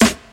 sparkle snare single hit a key, Royality free acoustic snare sound tuned to the A note